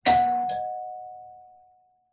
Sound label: Door Bell